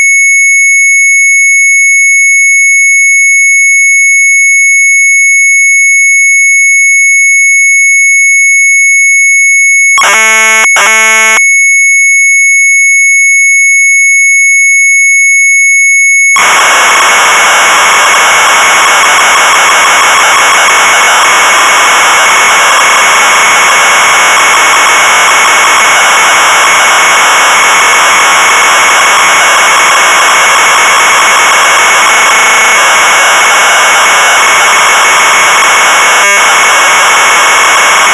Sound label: MZ800 Tape; mz800 wav; PR DISK; Sharp